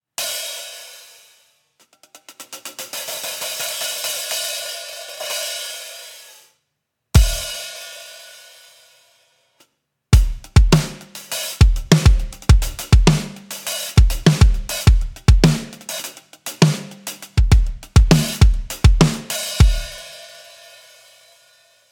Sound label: 14 Hats, frequency reduced hi-hat; sabian; 14 inch; frx1402